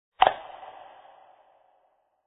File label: switch; vapesquared